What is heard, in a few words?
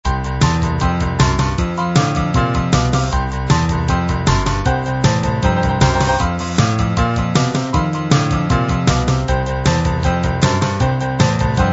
boogie